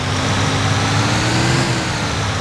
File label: second, vehicles; phantom